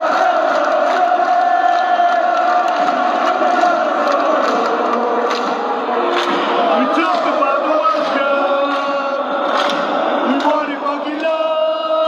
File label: allez allez allez, fan chants